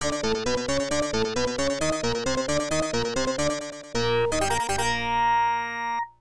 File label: Goal; blades of steel